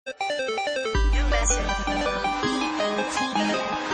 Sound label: New Message